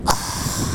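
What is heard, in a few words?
Click Suction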